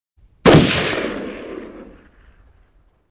AR-15 Automatic Rifle Single Shot with Bullet Shell; Guns & Ricochets